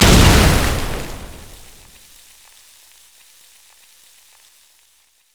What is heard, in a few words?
noxian thermal charge; LoL; urgot